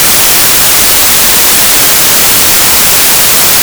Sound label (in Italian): rumore